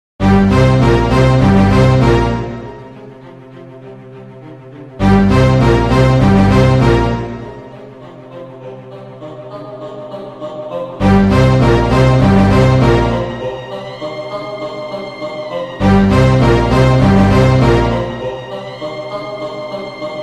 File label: entry bgm villan bgm; clone